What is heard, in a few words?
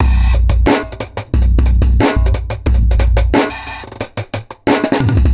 Beat 2-Bar, Breakbeats; KJ Sawka; Drum n Bass & Beyond